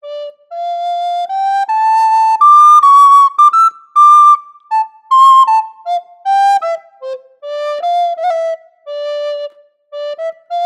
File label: Yamaha YRS302 BIII Soprano Recorder; FluteShop